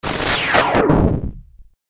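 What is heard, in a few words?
distorted lazer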